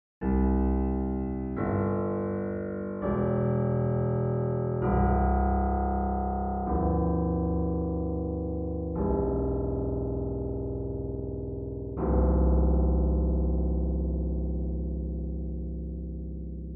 extended range

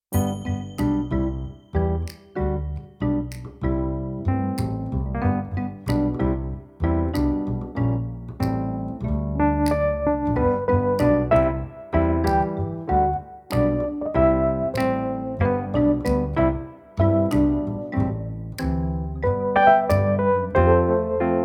Wurly; electric; pianoteq7

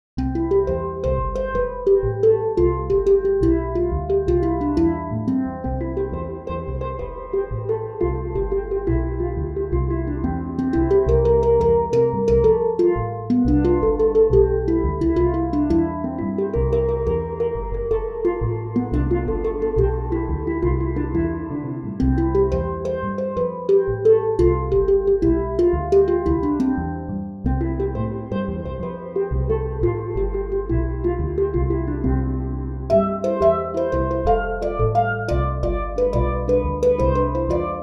spacedrum; steelpans; pianoteq8

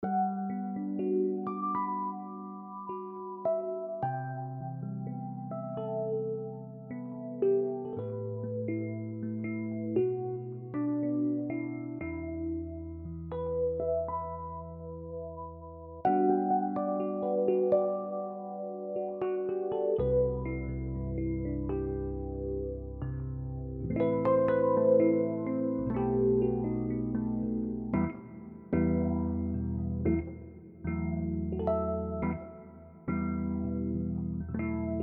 Pianet T Soft Pan Tremolo; hohner; pianoteq8

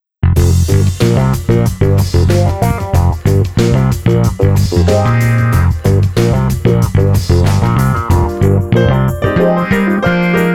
Clavi Groove; pianoteq8; hohner

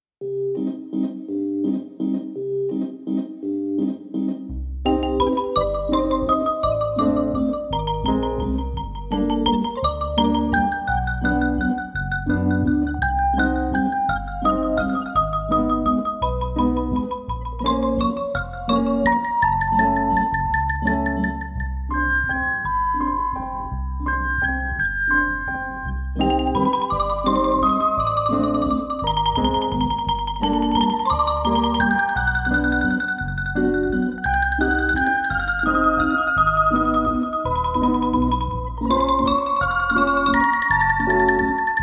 valse xylophonique, xylo; pianoteq7

